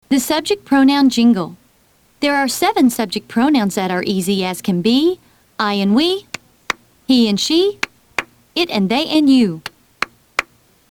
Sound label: subject pronoun jingle